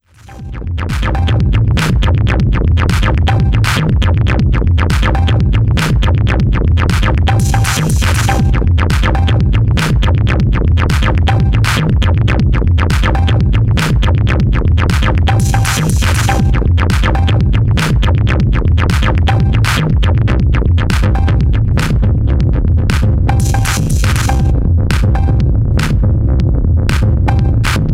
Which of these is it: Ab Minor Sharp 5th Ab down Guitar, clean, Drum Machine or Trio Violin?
Drum Machine